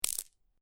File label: Marble Impact With Several Marbles; Small rattle of impact from marbles or pebbles.; Miscellaneous Toy Sound Effects